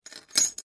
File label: keys